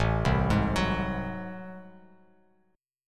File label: A Minor Major 13th A, Bass Standard; arpeggiated; AmM13; JGuitar